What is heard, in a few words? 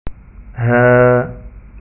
haaa